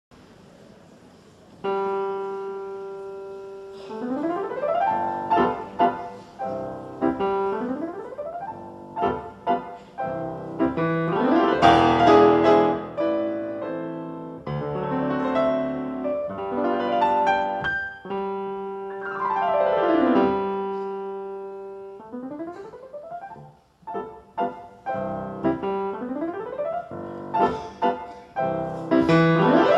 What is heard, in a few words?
Mazurka en Glissando; Lecuona